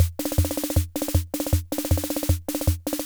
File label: Loop, classic machines, rhythm77